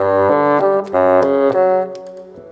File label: Bassoon, Orchestral, Rock Pop